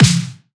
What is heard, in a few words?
snare zoom; Drum One Shots; Slate; SD MIDNIGHT Sample Pack